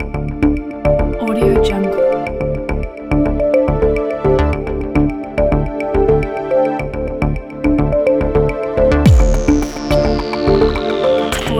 technology sci-fi music

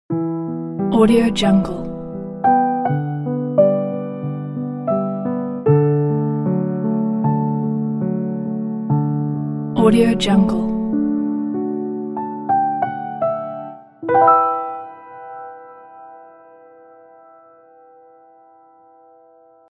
piano logo

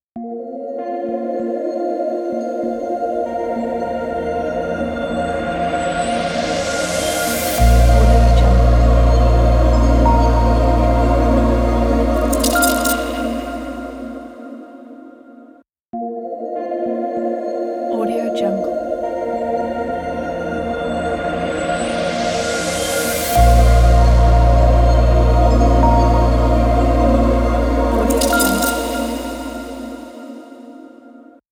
atmospheric logo music

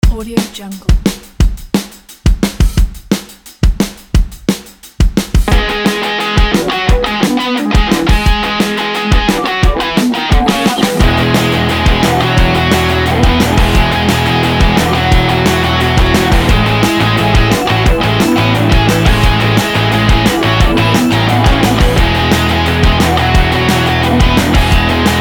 happy upbeat indie rock music

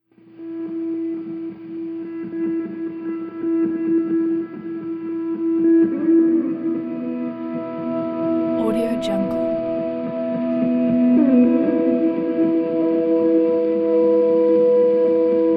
beautiful ambient atmosphere; themusemaker

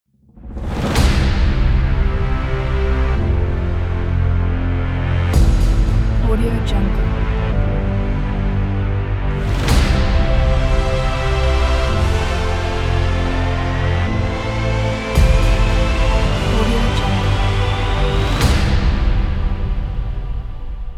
inspiring logo music

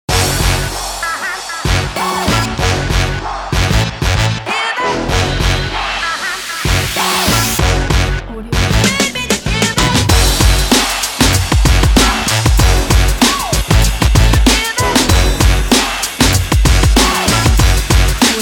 background hiphop urban music

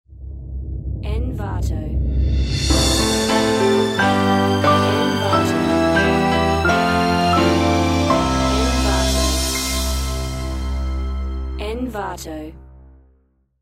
logo opening orchestral; slavador

